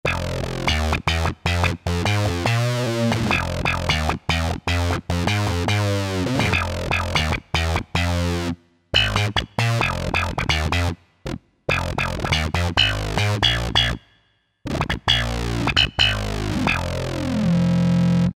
HYPER BASS, Roland SC-880; Factory Patches

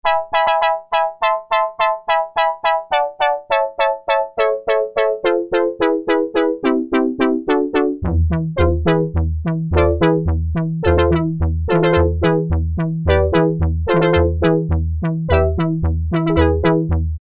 Glassy Wah, Roland Juno-106; Factory Preset

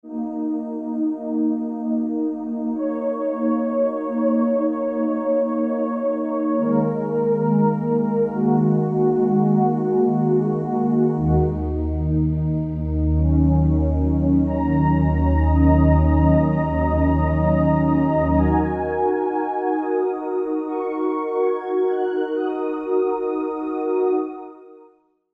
Soft Padding

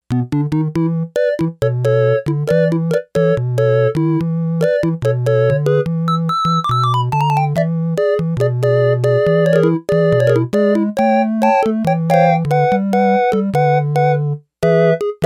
Yamaha DX11 Sy. Organ 1; Factory Preset Voices

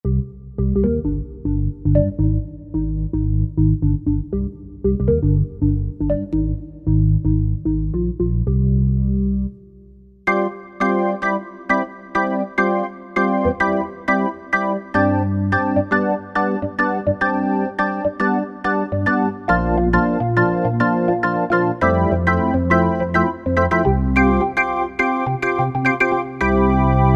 Organ 1, Korg M1; Factory preset examples

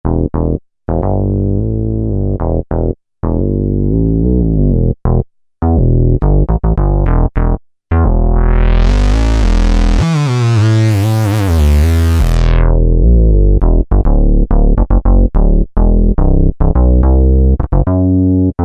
Korg microKORG MG Bass; Factory Voices

